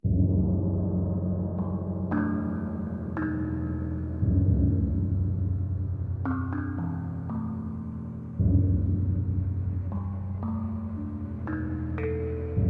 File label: Menu Music; Far Cry